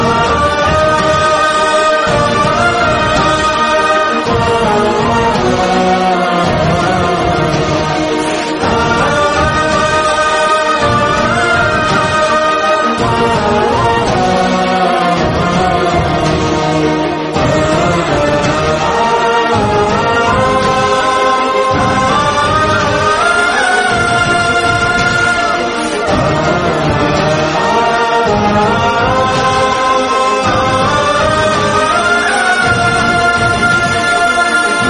daddy movie bgm ringtone

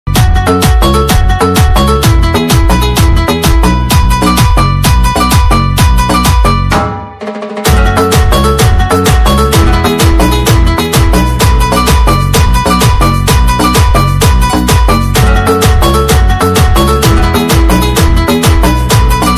remix ringtone

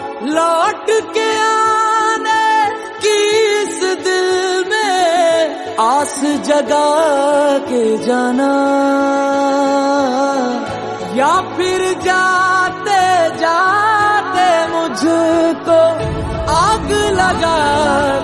hindi song

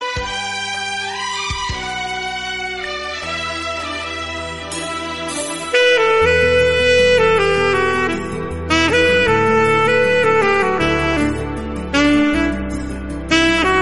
Instrumental Ringtone

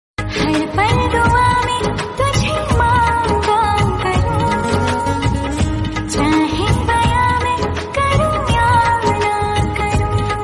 ringtone Female Version

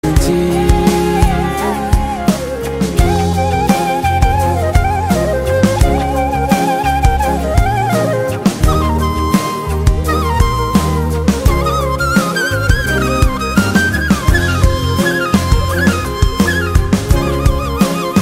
instrumental ringtone

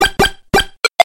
fireball ringtone; mario bros

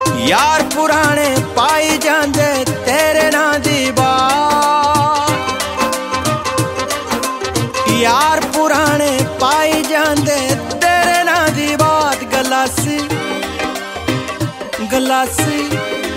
ringtone; glassy

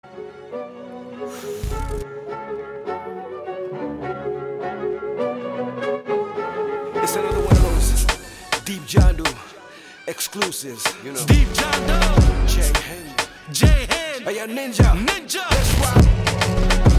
Ringtone

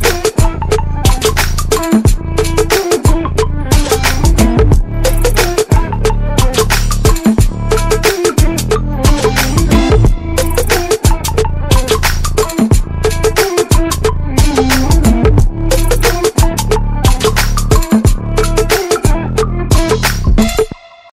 bgm ringtone; aavesham

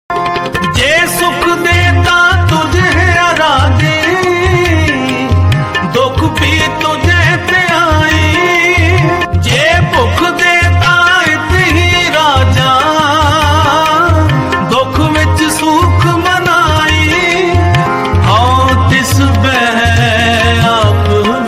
dharmik ringtone punjabi

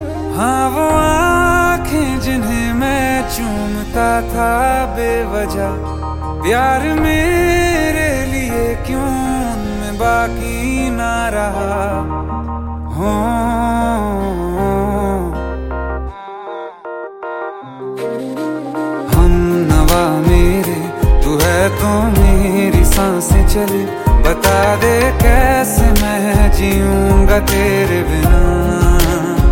song ringtone

